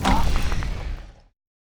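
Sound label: elemental damage up, monster; lake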